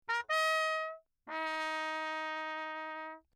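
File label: trumpet mute Lechner Bach signal; Perinétventiltrompete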